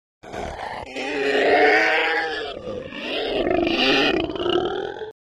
Alien Growl Large Cre; Large Creature Snarls And Roars.

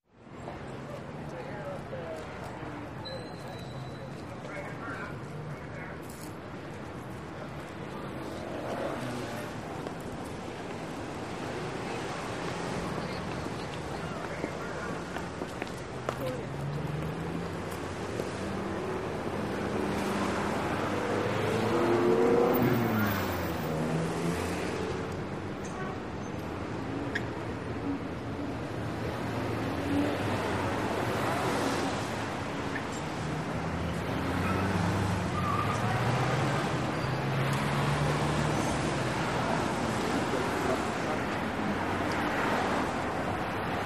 Traffic City Street, big city

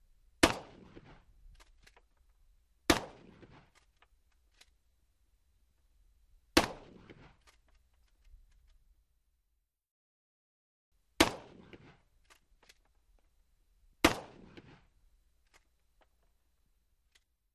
12 guage Shotgun Medu, shots

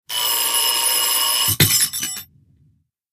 Clock Thrown Gnd Brea, alarm clock

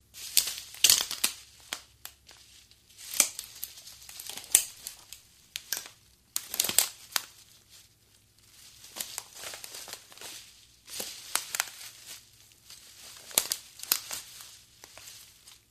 FS Mvmt On Branches, trees

